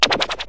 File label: noise waver